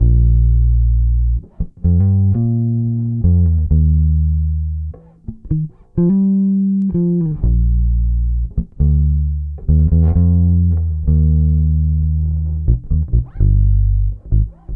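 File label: Melodic Loop Bass A major 65Bpm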